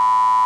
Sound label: Sinewave, SINGLE CYCLE 1K